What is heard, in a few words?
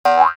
jump; FOXBEAR; DIRECTX